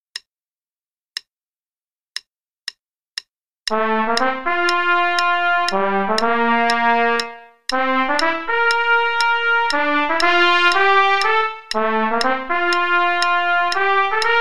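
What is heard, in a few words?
Play-a-long, trumpet only, quarter note = 120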